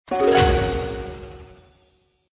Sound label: Animation Win, Dice